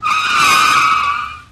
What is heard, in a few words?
Car Brakes Tires Scre